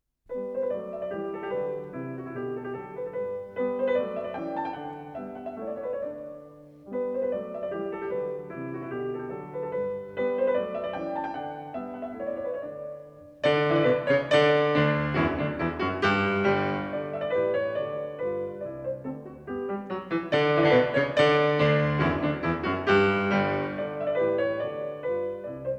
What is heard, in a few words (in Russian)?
fortepiano sonata dlya fortepiano vivace; l betkhoven; no 25 iii; fonogramma 6 7 klass dmsh